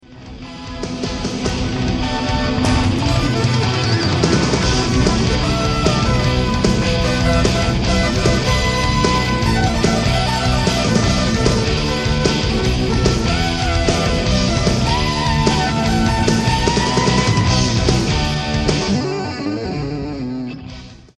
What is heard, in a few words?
lead sample Casio Fz1 Sample